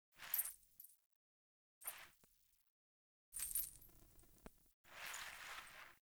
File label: Foley; Friendly; Verminia; Bromius Quest